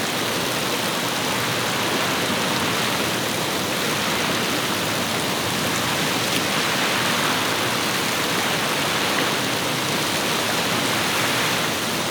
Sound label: Waterfall Medium Loop